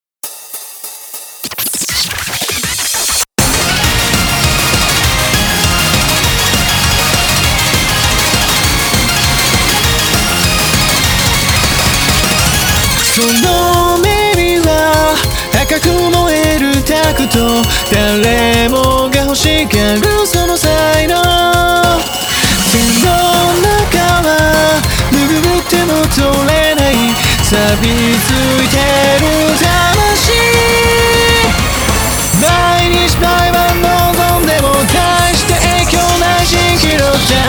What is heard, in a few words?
rock